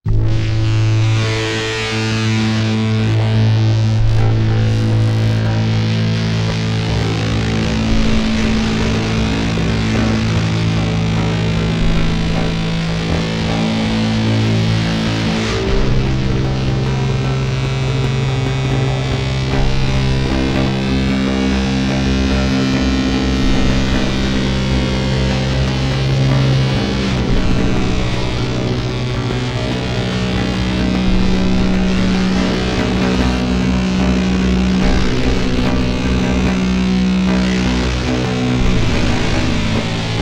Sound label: Ipecac Loop Music Box